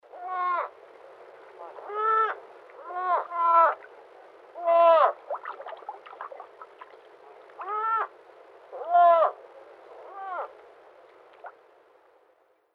Nutria Kurzlaute

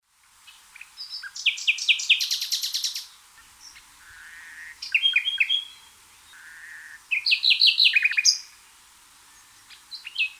Nachtigall Gesang

